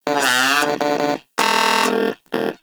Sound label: Moa Aggressive Vocal Battle Ends; Warframe; Characters; Pets